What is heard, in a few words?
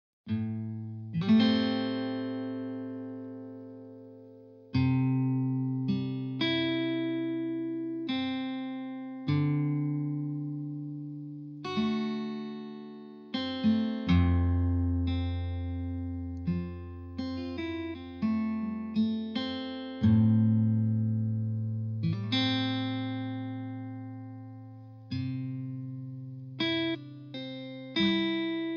Loading Screen; Secret Neighbor; ps4